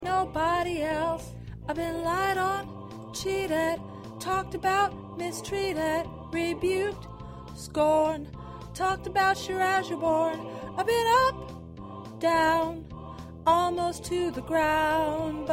1st Tenor

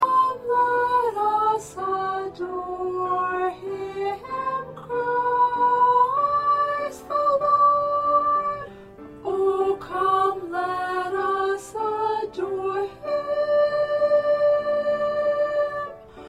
1st Soprano

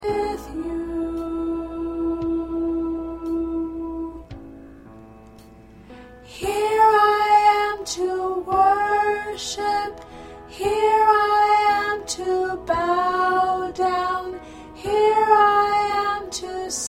Soprano, choir parts

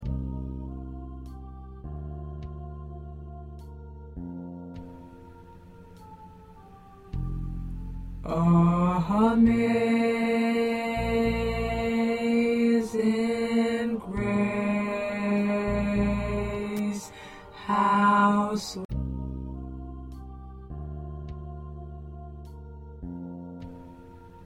2nd Tenor

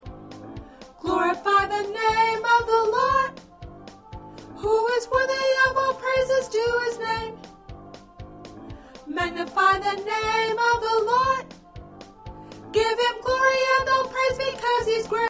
Soprano

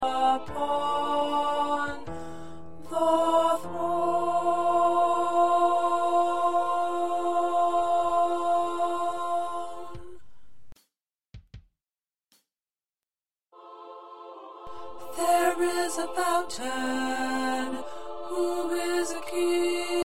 2nd Tenor

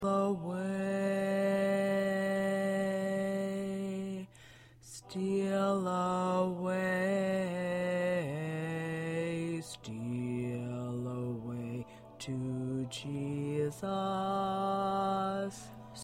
Low Voice

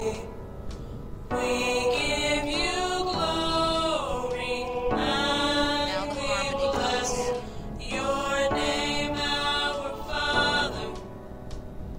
Tenor

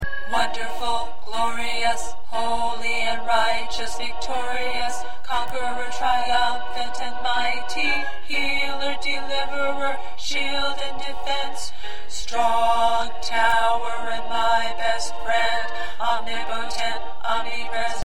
2nd Tenor, choir

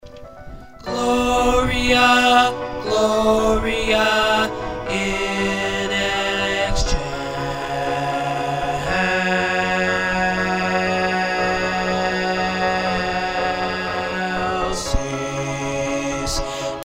Bass

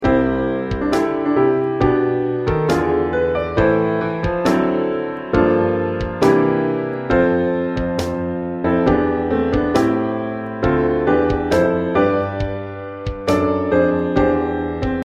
Piano Drum

